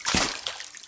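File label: Water Puddles Swamp Med, footsteps; shagov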